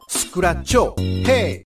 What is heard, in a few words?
scratch hey dj, hip hop ringtone